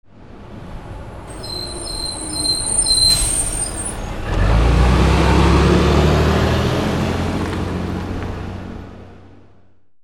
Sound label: VEHICLES